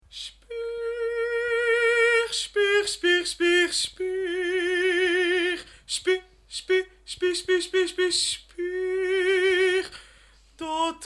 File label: Opera Solo